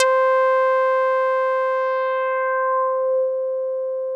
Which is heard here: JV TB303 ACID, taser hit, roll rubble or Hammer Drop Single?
JV TB303 ACID